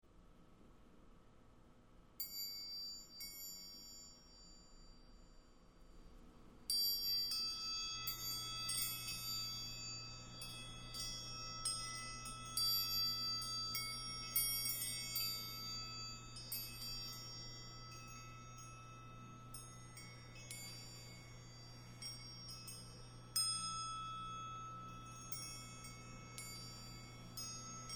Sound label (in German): gloeckchen solo